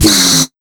TECH 140 FX, Big Beat Drumloops; AKAI

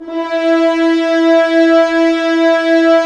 Horns; Roland - LA Composer vol. 4

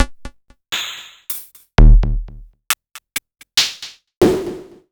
Korg Electribe ER-1 Kit

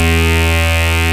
RAVE BEEP; Best Service Alpha Dance vol.2

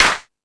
Hip House, drum machine

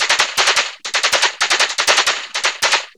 Vocobeat; Sonic Foundry (Sony Creative Software)

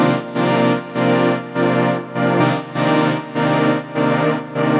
Pad 100 C, sidechained

